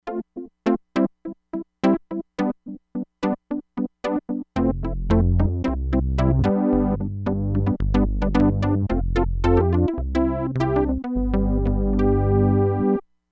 SAWTOOTH DECAY WITH DYNAMIC, Crumar Bit 99; Factory Programs

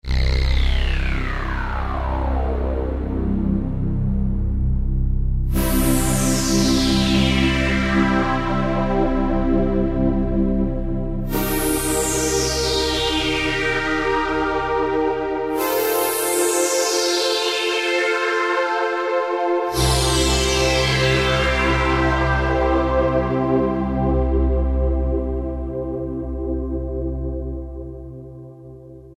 Spacious Sweep, Roland D-50; Factory preset demos

